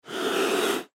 Panther Hiss Fast; Panthers; Animal; Animals; Blastwave

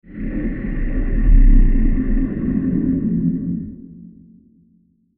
Extrem Low Horror Transition; Scary Soundscapes; Crafted Signals

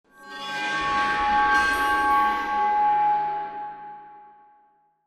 Sci Fi Swell Digital Bleeps Metallic Eerie

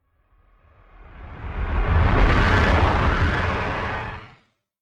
Whoosh Space Vehicle Slow Spacecraft; Scifi Science Fiction

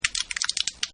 BREAKUP, button; Buttons; Multimedia; Partners In Rhyme

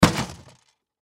Toy Chest Hit Impact Rattle; Miscellaneous Cartoon; Foley; Blastwave

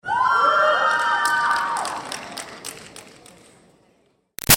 Crowd Teen Grad Scream Girls; Graduation; Reaction; BigRoomSound

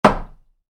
Wood hit wood, Wood, Light Impact: Wood; Materials; Stuart Duffield

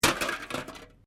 Can Softdrink Rubbish

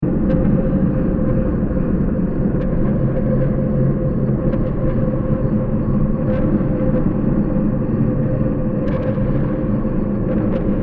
Deep Space Ambience With Growls, 120bpm; Science Fiction Sound Effects

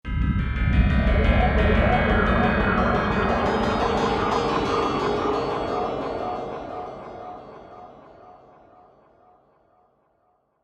Synth, Stab, Mysterious; Stabs; BlastWave

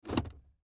Chevy Cavalier 2006 Gear Shift Down; Transportation Automobile; Car

